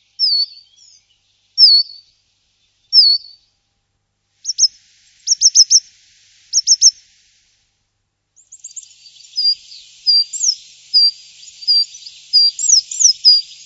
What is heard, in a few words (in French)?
mesange noire, oiseaux chant